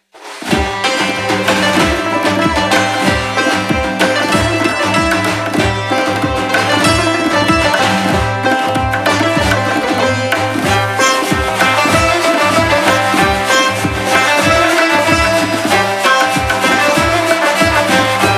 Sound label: Tehran Choir